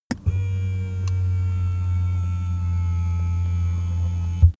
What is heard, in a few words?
car seat massage engine